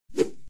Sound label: Tile Switch; UI